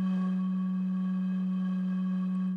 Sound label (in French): Le son produit avec une bouteille de bière Corona vide; durée 2,57 s